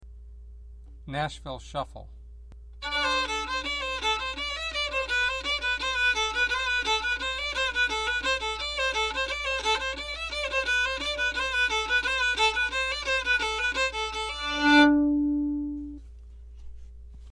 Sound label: bowlick, fiddle